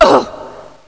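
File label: female ouch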